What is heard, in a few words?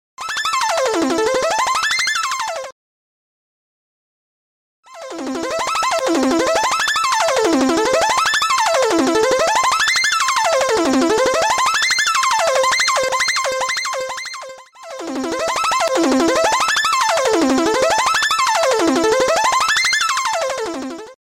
Loud Wake Up Alarm